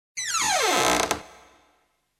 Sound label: open